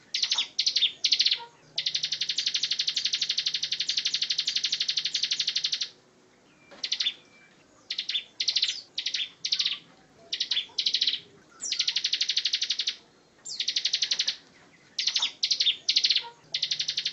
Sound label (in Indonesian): Suara Burung Ciblek Ngebren Merdu